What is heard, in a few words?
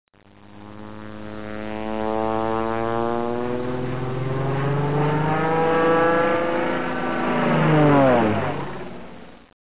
Diver, aircraft sounds